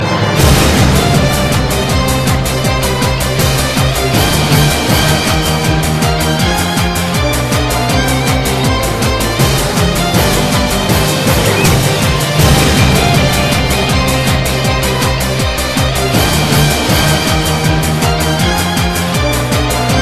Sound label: Distortion